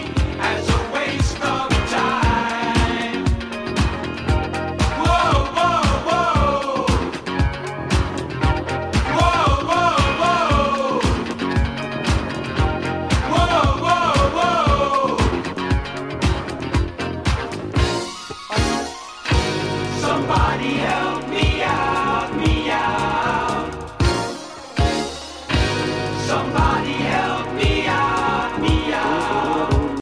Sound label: 12 Inch Dance 80s Groove